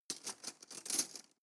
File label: cutlery teaspoon drawer handle